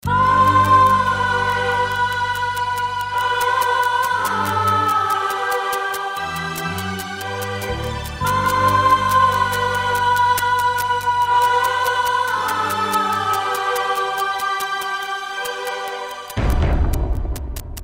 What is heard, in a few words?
Sad Song; Bandhan 1998